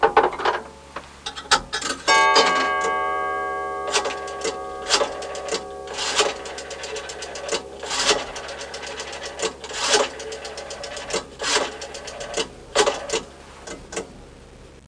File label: payphone; WAV Sound Effects; houshold; clokphon